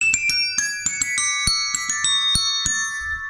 desk bells; nokia

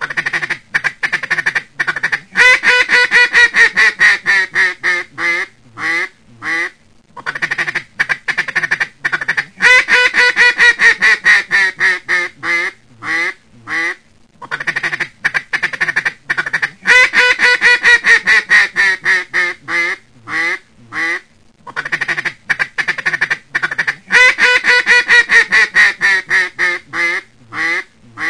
Ducks Ducks

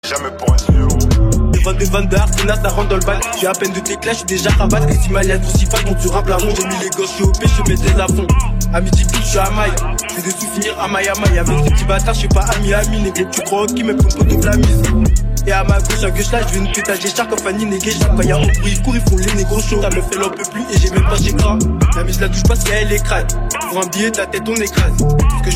Drill Fr

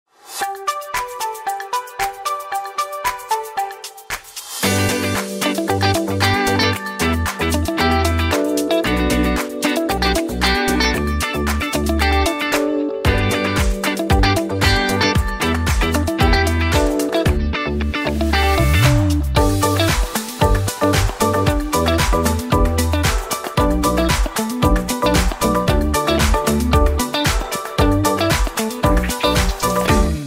samsung galaxy s24 series one ui 6, Sonnerie

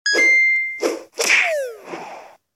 fail; flappy bird

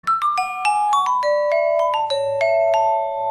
nokia glockenspiel

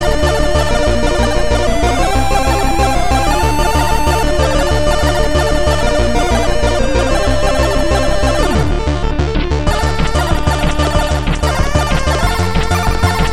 bit keygen music

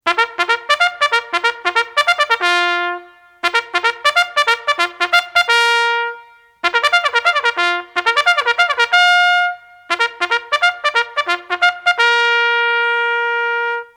military trumpet reveille

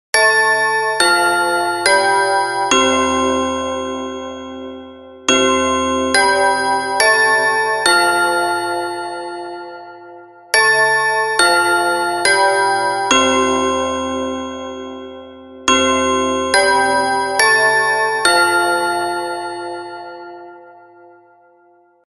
Clock Big Ben

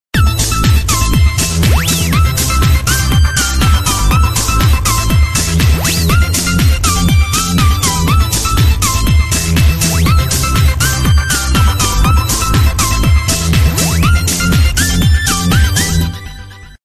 samsung galaxy school

